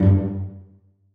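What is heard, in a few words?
celli pizzicato f#2; Sonatina Symphonic Orchestra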